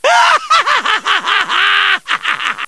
haha; deadpool

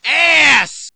rage; w00tguy; arcade

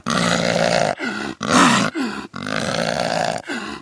tushkano aggressive

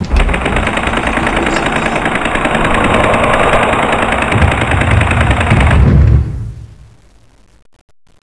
door move

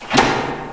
lever

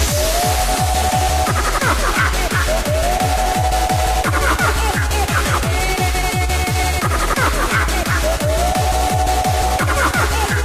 gnome loop; sven; misery

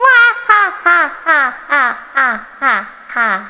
wahaha

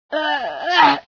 scream, female sci

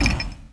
wrench draw

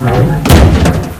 door metal close

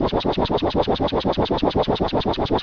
rotor, apache; c1

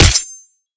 hit, knife; cof; guns

